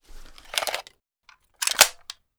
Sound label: reload short, crossbow; weapons; bms